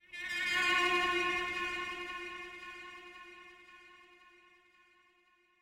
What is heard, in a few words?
Jingles VINES OPEN; Semblance